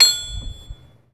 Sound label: Hammering metal; INDUSTRIAL